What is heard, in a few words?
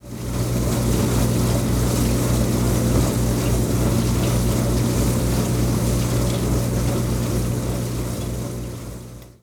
dishwasher Start; Singles; French Version